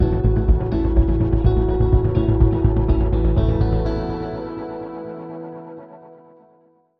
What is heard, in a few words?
Bumper